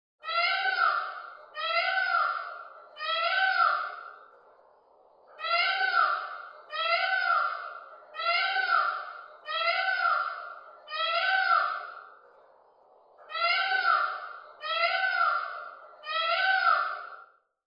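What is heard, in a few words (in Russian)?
pavlin golos, peacock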